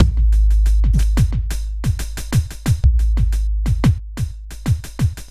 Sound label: Smooth, R&B Drums